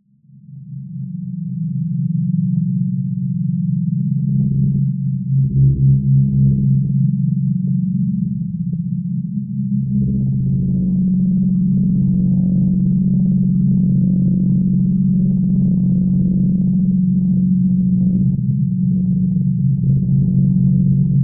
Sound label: Drone Sci Fi Abstract Cacophonous Glowing; skyes audio